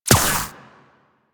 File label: Shield Impact